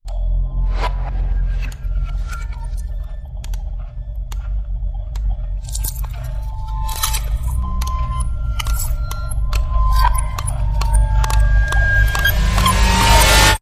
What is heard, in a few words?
Music Box Rise